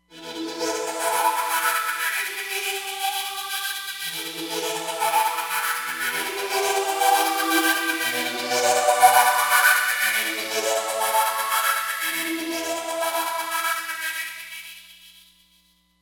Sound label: HPF Slicer, Roland Fantom XR